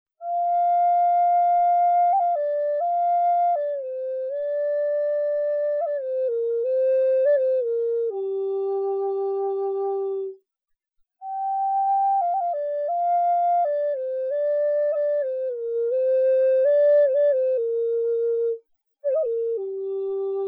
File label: Large G, Flutes Long; Osage Orange